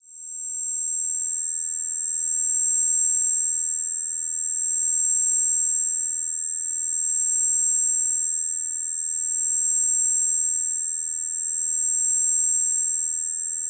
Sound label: Ringing In Ears